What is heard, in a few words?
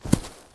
Hits Bottom